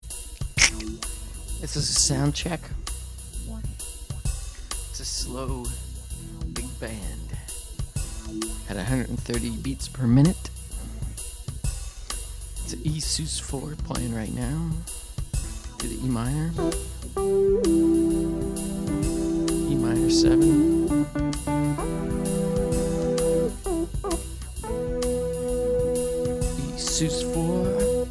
Soundcheck

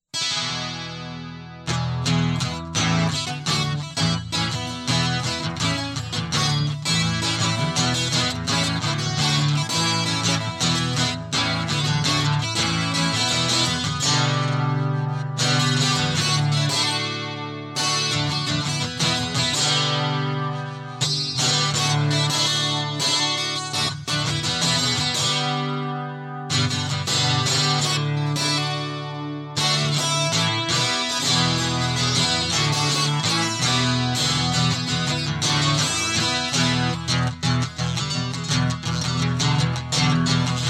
Guitar Loop

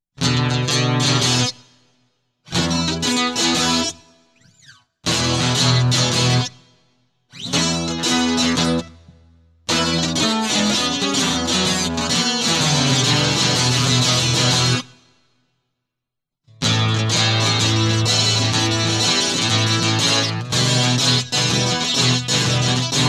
Blues

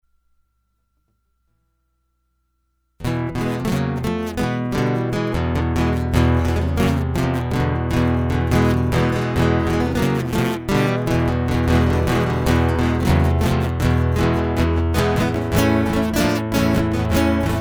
Guitar Prelude

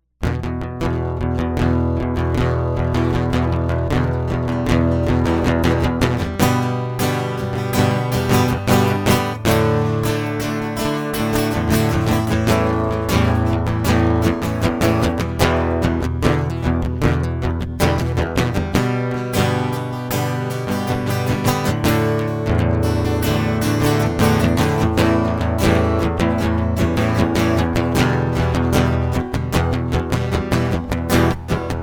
acoustic

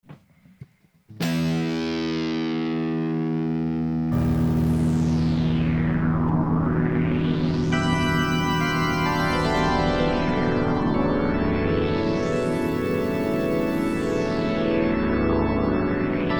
Psychedelia Progressive Rock Reprise

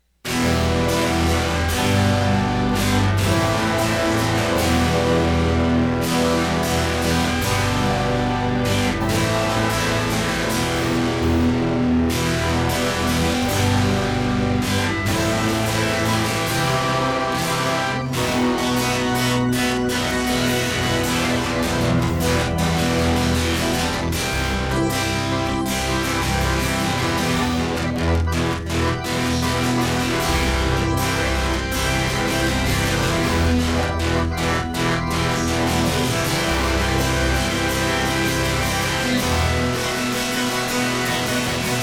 electric

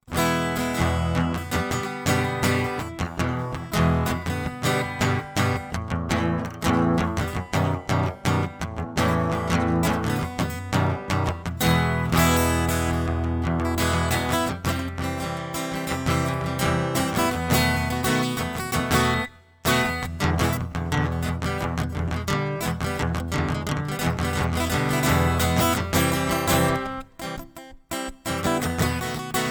acoustic

